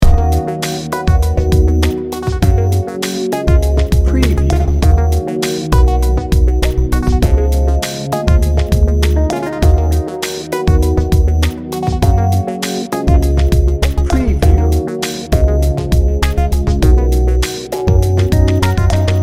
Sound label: loop